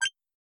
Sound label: digi squeak